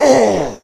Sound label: orc die; deliantra